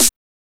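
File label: HSE SD, house snare drum; X-Static Goldmine 1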